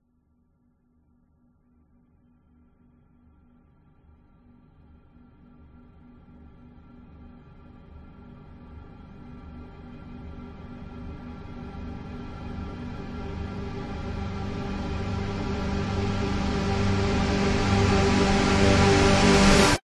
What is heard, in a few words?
sound design cinematic riser reversed orchestral hit